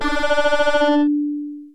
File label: strings